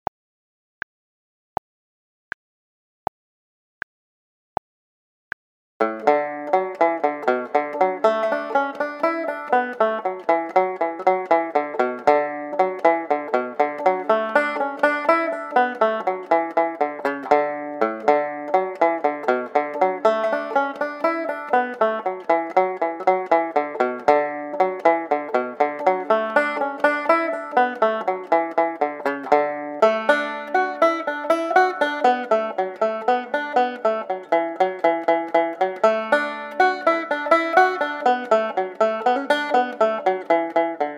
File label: jig